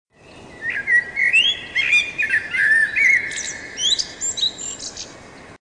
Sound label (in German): amsel